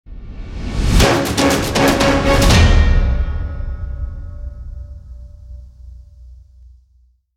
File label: battle victory intro; Starcrawlers